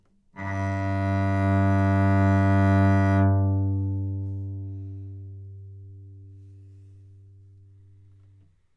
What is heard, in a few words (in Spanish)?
Chelo G Bow